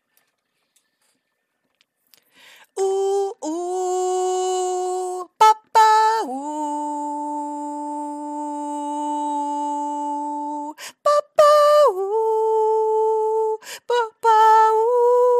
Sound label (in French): basse; Voix Basse (TT); L’intro de notre chorale, qui lance chaque concert.